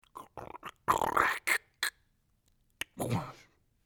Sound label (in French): verre en plastique écrasé et jeté; mouth-fishing